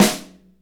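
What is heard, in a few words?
snare, Motown; Northstar - Drumscapes Roland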